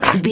zombie voice attack grunt

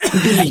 voice male cough short croak

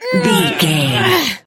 voice female effort push

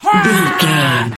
voice male battle shout long

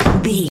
door close slam

